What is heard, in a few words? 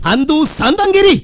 kick